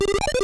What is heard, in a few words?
Magic; Shenlong